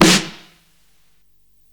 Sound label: Snare, Psychedelic Drums